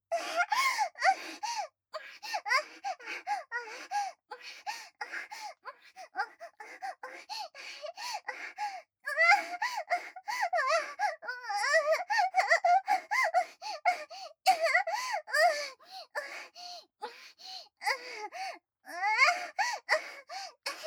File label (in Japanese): あえぎ声